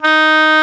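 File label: D#4 hard vibrato, oboe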